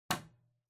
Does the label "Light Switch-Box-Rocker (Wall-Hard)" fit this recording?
Yes